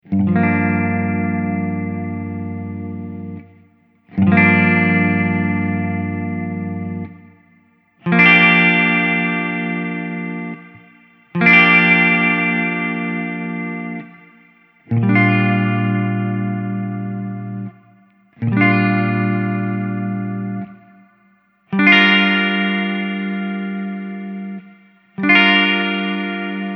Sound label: Minor 7th